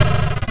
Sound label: stones; mazeofgalious